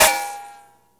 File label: bullet ricochet metal